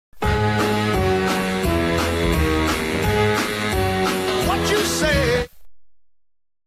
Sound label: jingle pub; rtl9